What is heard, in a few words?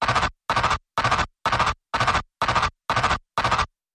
Serpent Shiver